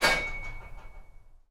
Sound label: grind exit